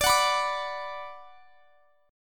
D 6th D down, chord; D6 strummed

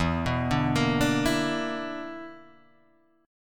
B Minor Major 7th Double Flat 5th, chord

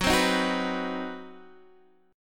C Minor Major 7th Flat 5th Gb

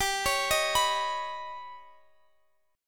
Eb Augmented 7th G

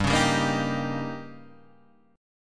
Eb 7th

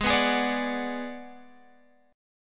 Ab Minor 7th Flat 5th Ab, down, Guitar, Open G

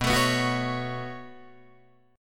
B 7th Suspended 2nd, Guitar, Open D

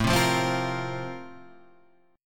A sharp Major 7th Suspended 2nd A

